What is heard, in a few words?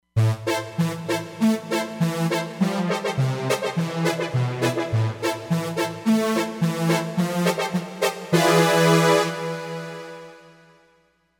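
Wide Synth Brass; Roland Fantom XR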